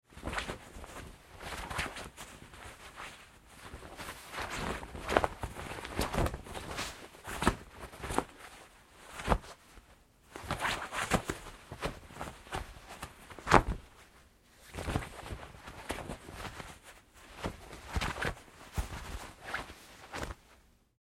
Foley, Fight Moves, Pillow Case, Struggling; Wrestling And Struggling

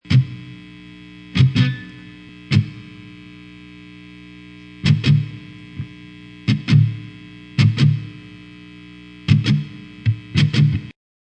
Distorted electric guitar noise.; Guitars; Musical

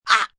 Grunt Short Ah, Grunts, impact, female; graons; Groaning; VoiceBox

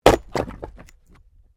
Wood Chopping Axe; Objects

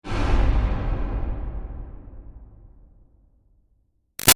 Boom Kick Dramatic Reverb

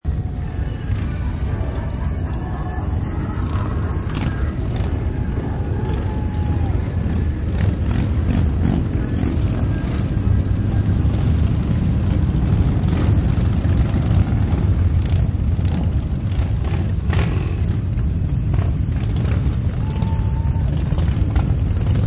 Motorcycles, Thousands of motorcycles drive by; Thousands of motorcycles drive by at Rolling Thunder Memorial Day Rally near Pentagon

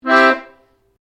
PIANO ACCORDIAN CHORD SHORT, Old Piano accordian; Pianos (Sound Effects:Musical)

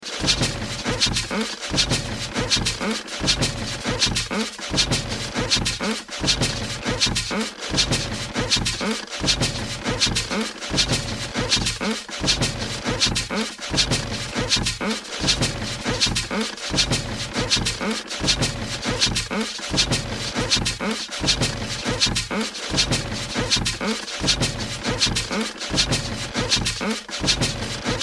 Sci Fi Workshop Machines Mechanical Steampunk; Tech; Sci Fi Workshop Machines Mechanical Steampunk Metal Small Movement Inventor Clanks Clicks

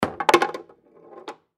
Foosball Table; Foosball Table Goal Ball Roll Fast; Sports

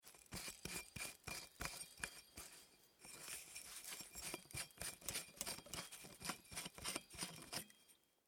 Household Light Bulb Unscrewed; Lamps; SFX Bible

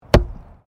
Archery Straw Target Impact; Various archery sounds; Miscellaneous Sports; SoundEffects-Foundation1

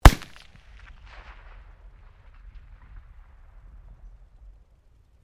RIFLE 303 MK1 FIRING

